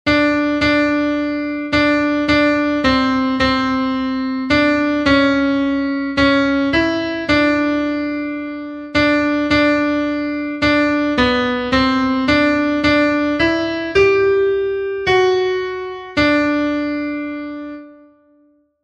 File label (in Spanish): contralto, himnos en piano; cantos del camino